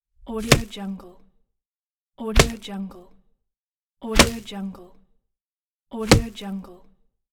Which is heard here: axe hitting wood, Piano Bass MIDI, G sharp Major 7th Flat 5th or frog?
axe hitting wood